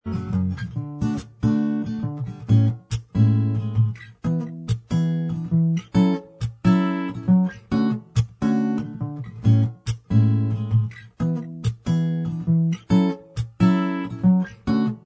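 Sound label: acoustic instrumental, backing track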